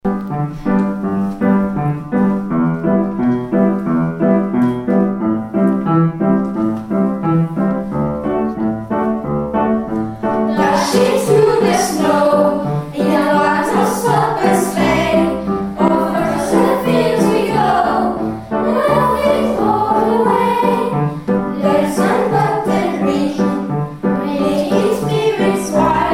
Jingle Bells Vive le Vent Ein kleiner weisser Schneemann